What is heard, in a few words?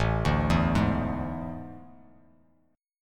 A Major 7th Suspended 4th, Bass Standard; AM7sus4; arpeggiated; JGuitar